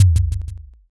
kick

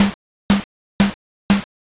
tatami step

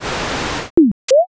gacha roll

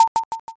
shogi

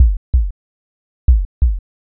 heartbeat

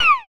monster hit

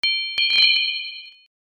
shrine bell

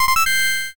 short fanfare

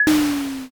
auto door

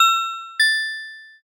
shop enter

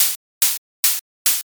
leaf step

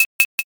scissors

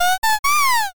rooster

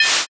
slash heavy

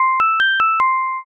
departure melody

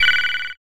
griffon

